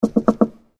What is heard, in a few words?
Door Knock